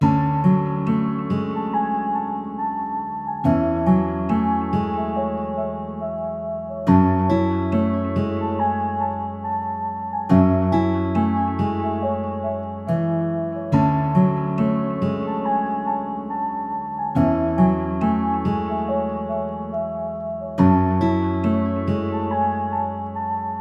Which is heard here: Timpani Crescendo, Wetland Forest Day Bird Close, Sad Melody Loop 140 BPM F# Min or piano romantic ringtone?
Sad Melody Loop 140 BPM F# Min